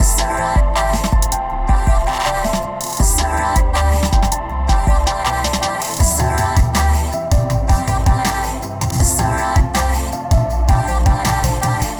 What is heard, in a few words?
Absolute 80s, free loops